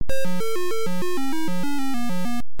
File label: Game Over; Gunfright